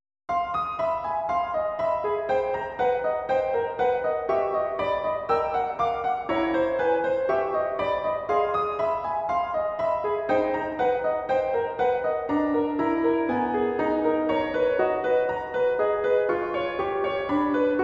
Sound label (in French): Tic tac pour piano